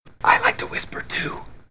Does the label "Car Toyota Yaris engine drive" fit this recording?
No